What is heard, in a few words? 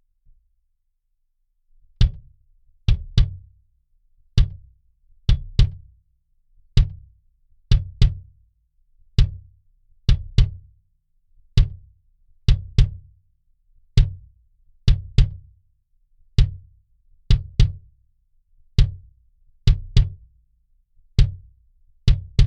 pr40 clean